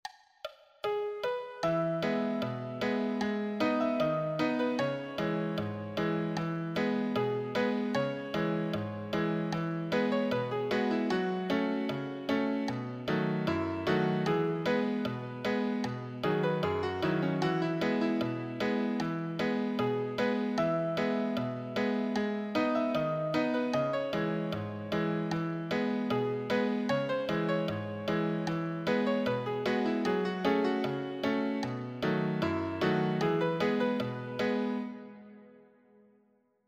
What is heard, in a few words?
solo, piano